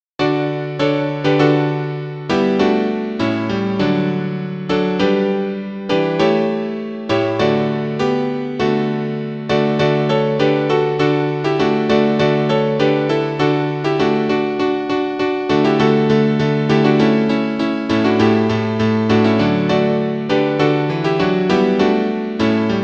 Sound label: Joy to the world piano; Weihnachtsprojekt 2024